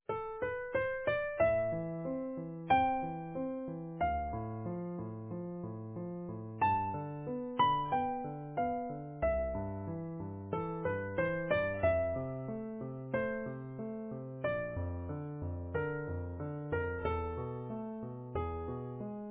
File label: easy piano